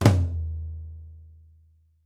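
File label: New Jazz Tom Low Flam, Roland BK-5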